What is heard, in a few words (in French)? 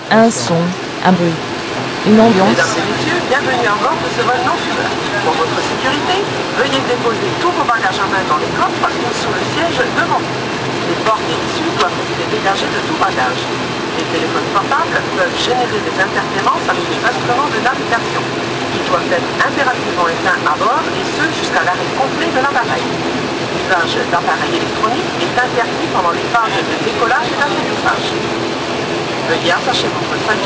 Un son un bruit une ambiance